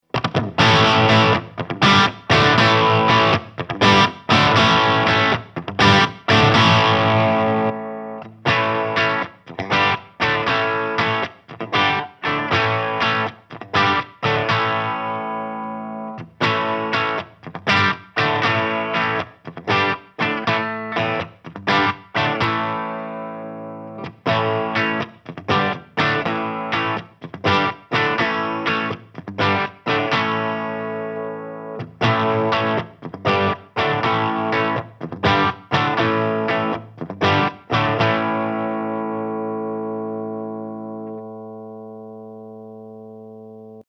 BC Rich The Rich 6 string Bridge Pickup 5 way switch